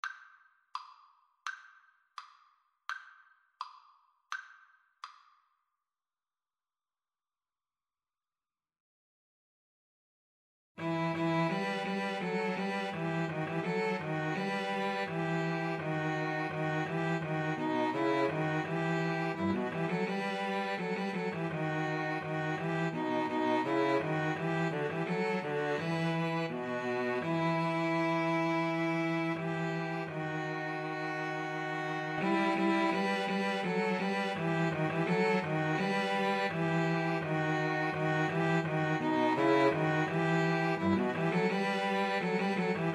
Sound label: Piano Trio